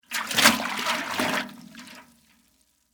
Water Slosh Bucket, Water, Slosh, In, Metal, Bucket, Large; Splashes (Sound Effects:Objects); BlastWave